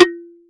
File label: Cowbell Perc; House; Drum One Shots; Percussion